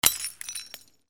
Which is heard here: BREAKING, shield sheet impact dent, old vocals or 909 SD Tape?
BREAKING